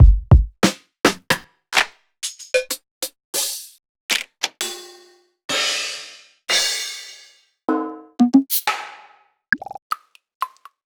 drum

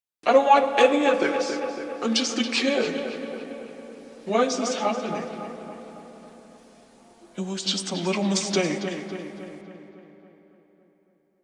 vox sfx

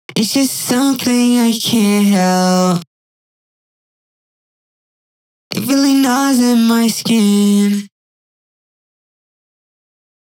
vocals

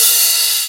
Open Hihat

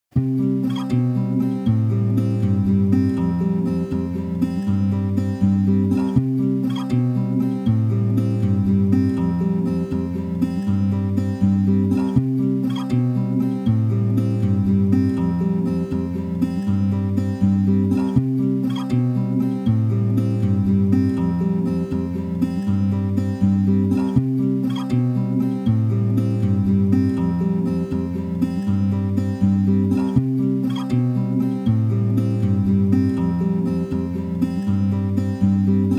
guitar

